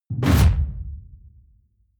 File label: sci-fi catapult fire ball sling; schusswaffen